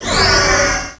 FLYBY; CYBERSPD

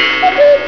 CUCKOO

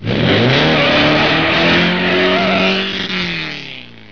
CAR

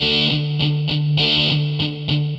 GUITAR, ROCK POP; MAGIX

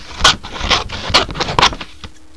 CUTTING

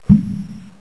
TAIL

